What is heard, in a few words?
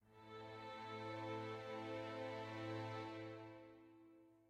sad soft end